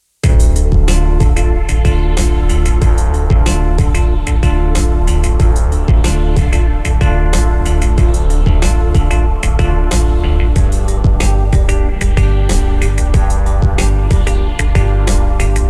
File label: Choir Dance, pop